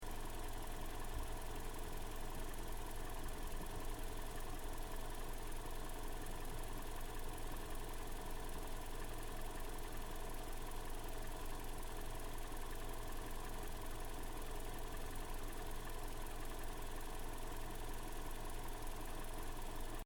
CAR TOYOTA COROLLA IDLE ENGINE BAY LOOP STEREO, hatchback; 2009 Toyota Corolla hatchback; Cars; Vehicles